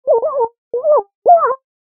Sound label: Beep And Bleep Sequence